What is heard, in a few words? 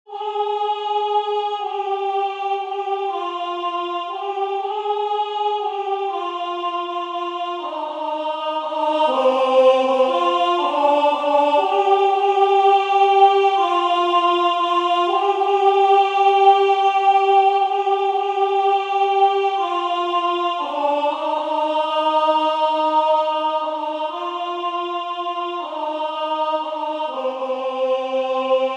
blow blow thou winter wind alt